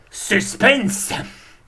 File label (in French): Suspens